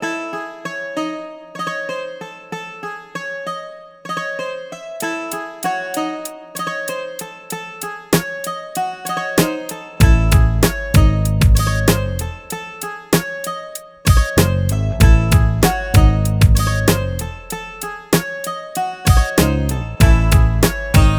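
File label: 096 A minor, Hip Hop Exotica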